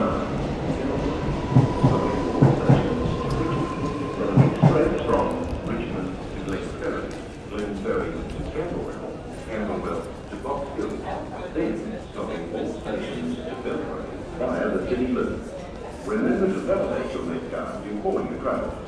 next train

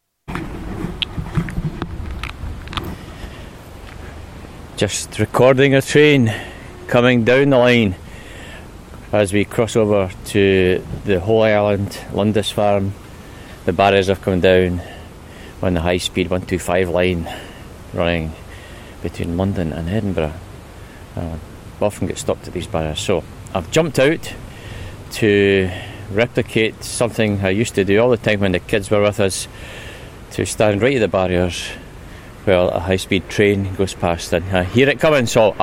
fast trains soundscape, northumberland

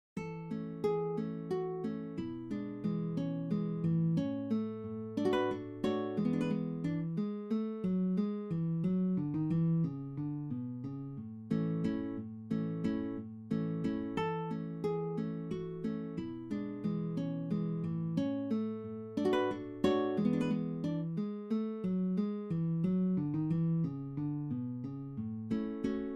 bolivian waltz

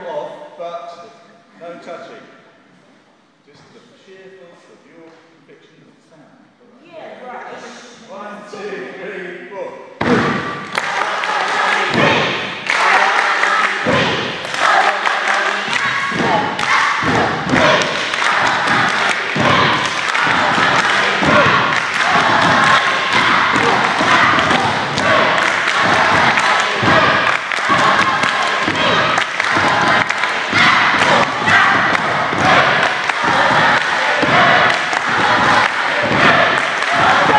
phat beats

